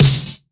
sparkly snare drum sample c sharp key, Royality free snare drum sound tuned to the C# note